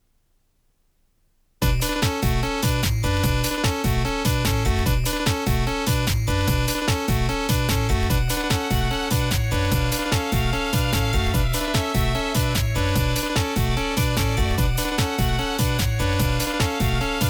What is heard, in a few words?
techno gold-plated tape; gxz7100ev